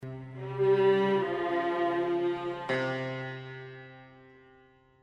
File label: sms